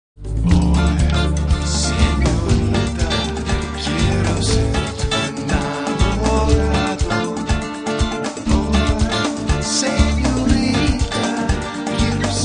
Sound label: dance